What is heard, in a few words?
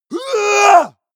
male combat attack long